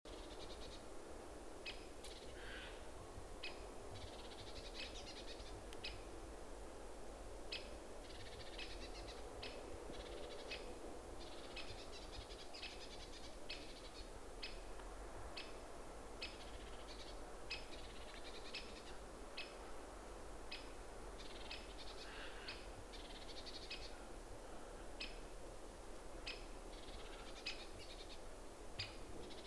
Distant woodpeckers tweet in winter woods loop; UltimateFishing2020

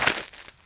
STEPS Gravel, Walk

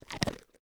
Chew Food; UltimateFishing

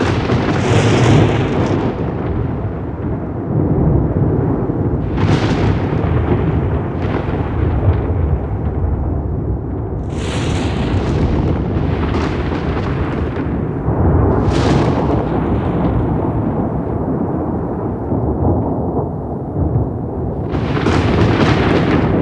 Thunder Ambience; UltimateFishing2020

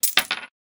money cash; Ultimate Fishing Simulator 3 Demo

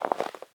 Soft Snow Footstep; UltimateFishing

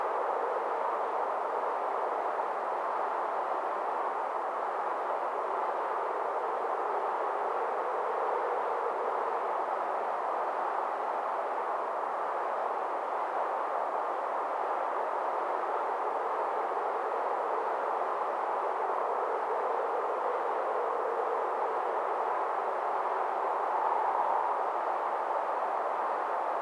Thin Wind; UltimateFishing2020

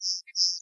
Sparrow; UltimateFishing

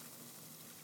line flying, fishing; UltimateFishing2020

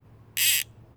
Fishing Reel; UltimateFishing2020

